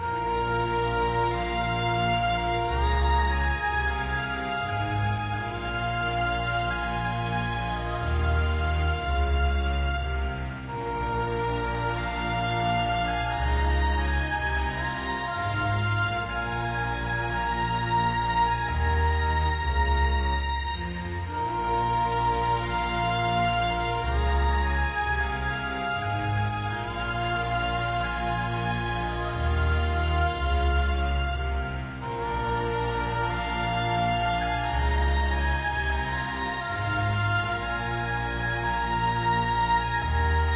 Tension Melody; Great Wall

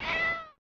Tile Match (Meow); Shanghai 2 Dragon's Eye; snes

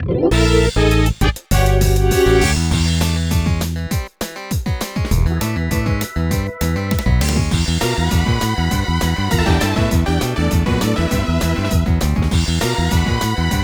Battle Fast; New Super Mario Bros. U Deluxe; Boom Boom

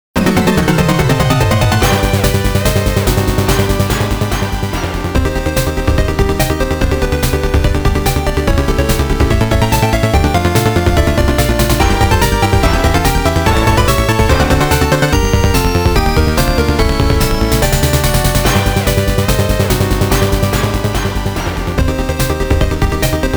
Boss fight; actraiser original soundtrack symphonic suite

